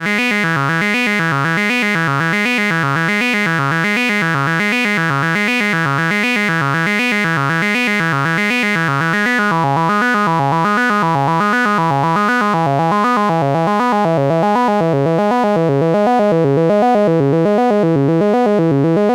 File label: 24db LOW PASS V OKT Tracking; GRP Module